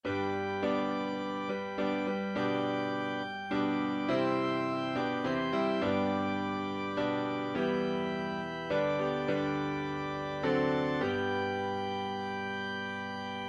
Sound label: Amazing Grace Piano and Organ